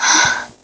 Breath; Lewd SFX

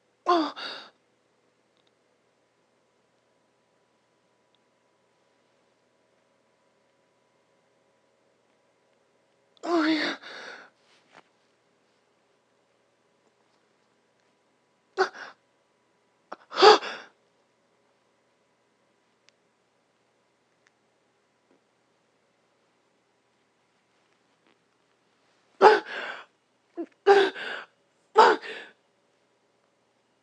orgasm

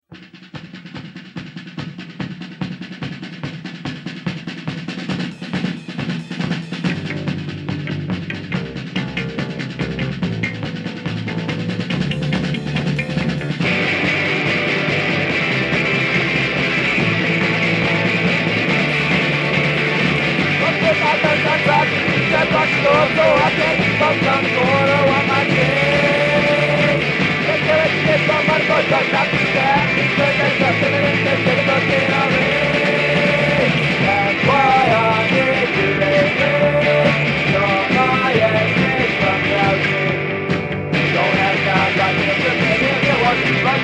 Bloody knuckles, song

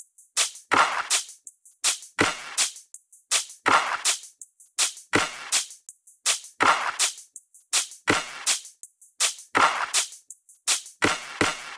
Beat main, 81.5bpm; Pony Fan Music Remix Stems

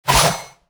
attack launch; Li Li Shadowpaw Sound Effects; Li Li Heroes of the Storm Responses; warcraft

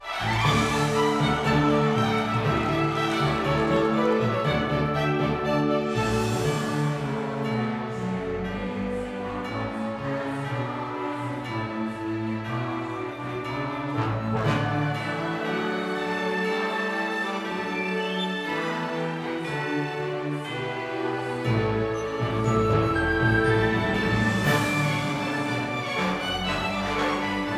The Heart Carol (instrumental); My Little Pony OST Repository; Season 2; Bonus Music

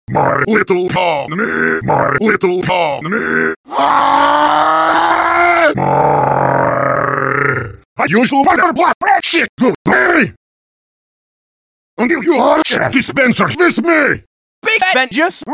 Opening Theme (Acapella)

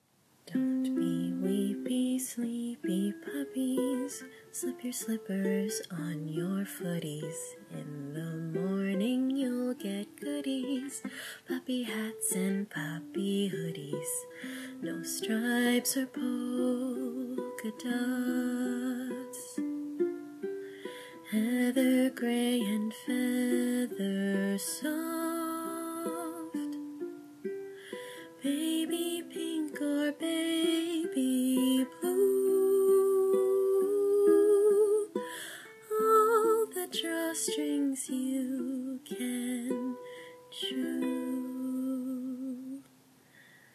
ukulele cover

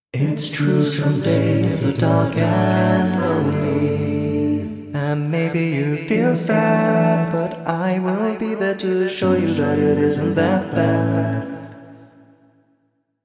A Capella

